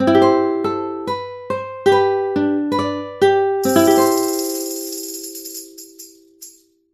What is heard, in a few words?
ukulele, jingle